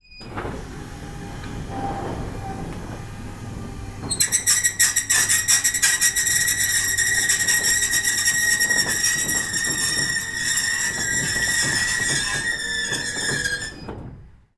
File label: garage door electric roller up squeaky; household